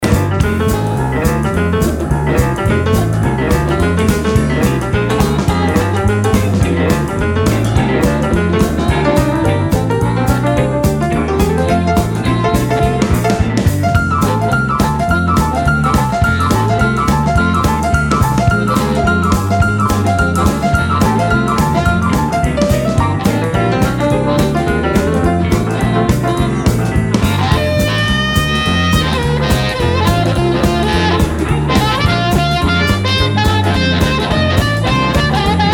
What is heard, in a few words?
Swing Blues